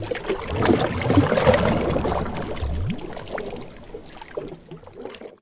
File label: Canoe Paddle Stroke; rowboat & canoe